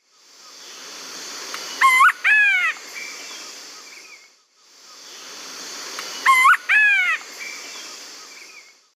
Celeus grammicus, call; S. America; Eastern Lowlands

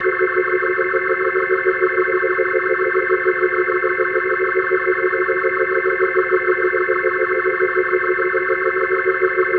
Organ F# Dim; Dance; Organ Licks

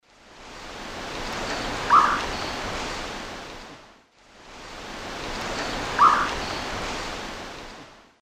Thamnophilus praecox, call male; S. America; Eastern Lowlands

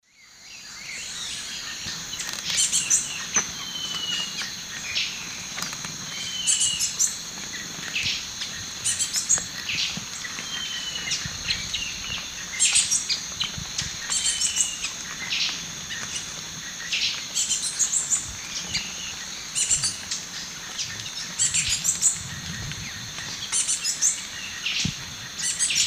Tachyphonus cristatus, begging calls; S. America; Eastern Lowlands

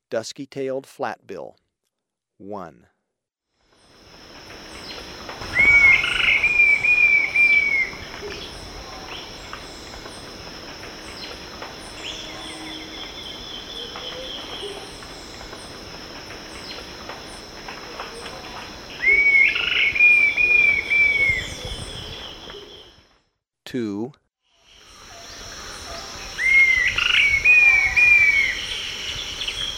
Dusky-Tailed Flatbill; S. America

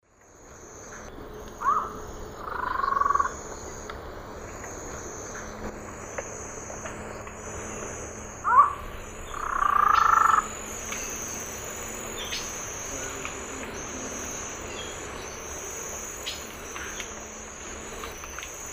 Thamnophilus cryptoleucus, calls; S. America; Eastern Lowlands

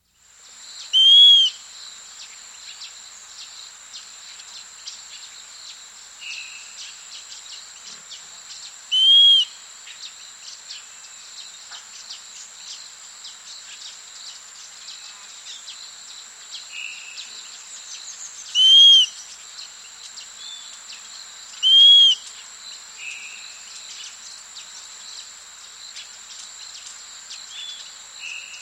Western Wood-Pewee; S. America

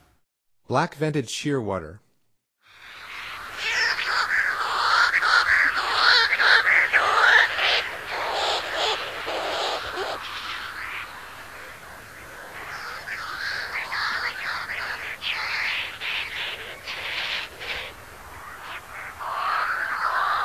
Black vented Shearwater; North America; Stokes

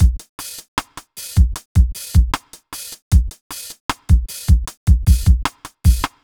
r and b

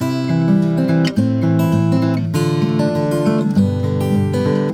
Guitar, Acoustic

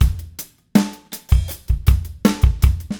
Slow Groove Beat, Rock Drums

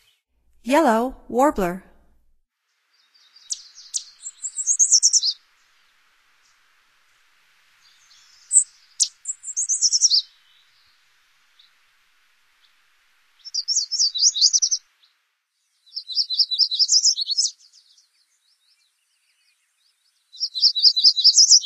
Yellow Warbler; North America; Stokes

